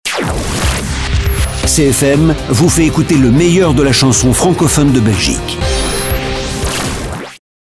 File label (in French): radio fête de la musique jingle; CFM RADIO